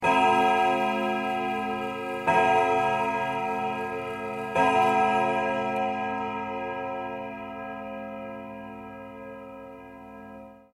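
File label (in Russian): напряженный звон колокола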